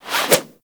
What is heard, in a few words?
Zipline Brake Foley; Gadgets; Parkour Zipline